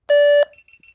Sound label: Power